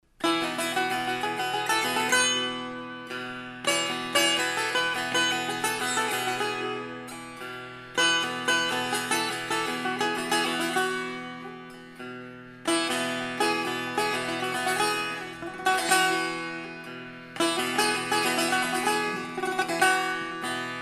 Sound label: persian setar, walnut wood